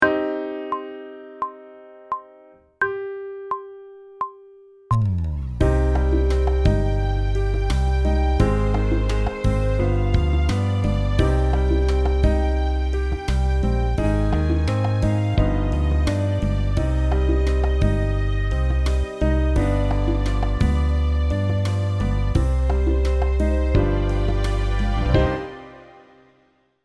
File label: Sight Singing backing track, pop vocals; Grade 4